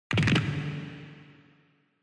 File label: Fracture Reverb